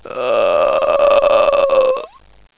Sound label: mummy attack